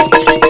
RHYTHM, CMI

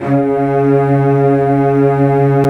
Cellos; CMI

